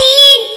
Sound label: normal hit whistle; osu